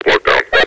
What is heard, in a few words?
sn blockdown, sniper; Source Engine; video game; npc